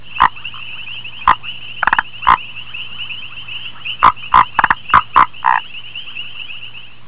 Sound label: foam nest frog